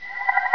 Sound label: messenger new email